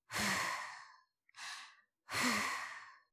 ふーふー

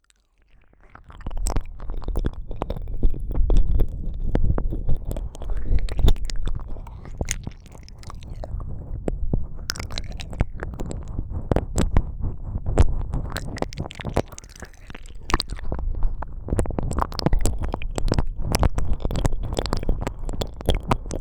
舌を奥まで入れる耳舐め 両耳同時, ASMR

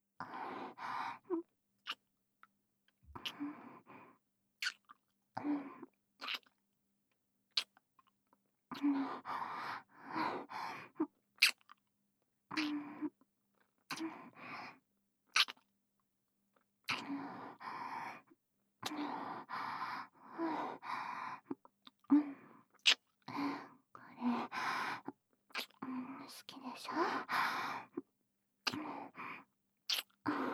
耳舐め

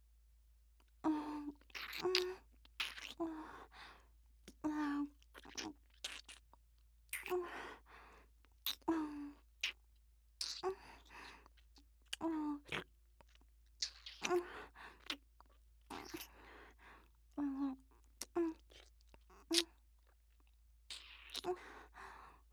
お姉さんのディープキス ループ; 僕とお姉さんの音声; ループ音声集

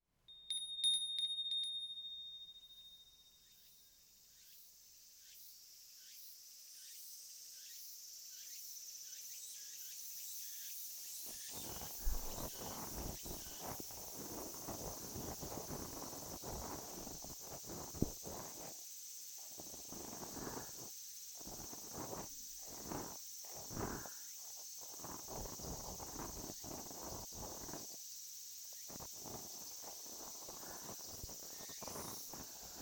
耳かき; ASMR; 三年後の耳かき

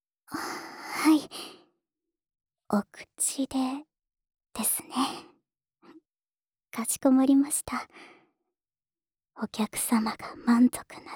フェラ, ASMR; 六花

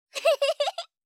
笑い; ASMR; 相づち・反応系【ポジティブ】

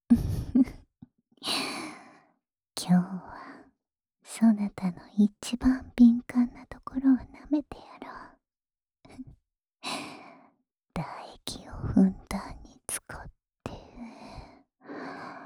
オナサポ用ふっかーい耳舐め（右耳）; ASMR

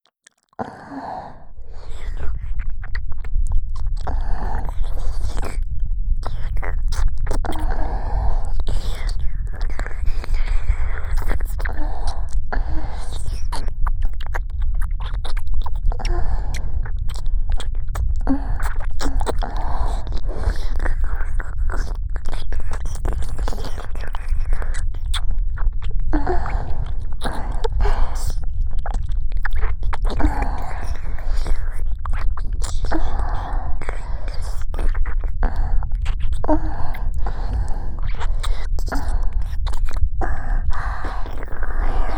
両耳舐めループ 耳塞ぎ有り, ASMR

